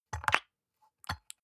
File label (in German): soap dispenser press squish, badezimmer